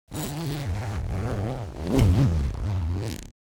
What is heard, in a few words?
Softshell suitcase zipper